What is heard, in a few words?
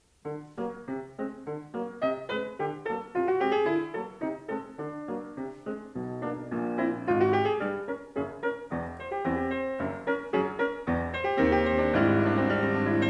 Piano Sonata